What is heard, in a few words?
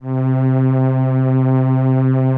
SOLINA, String Machines